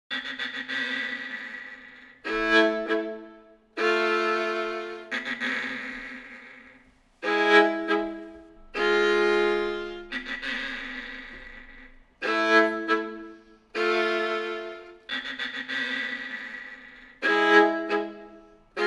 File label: Tango